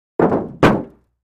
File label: Car Roof Impact